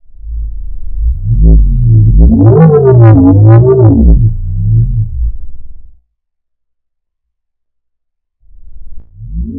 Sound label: growl 100, synth loops